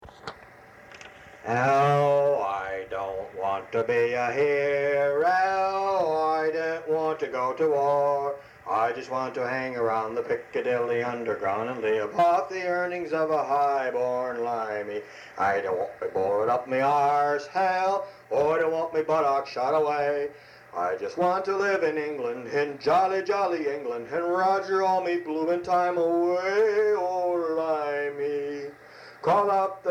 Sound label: song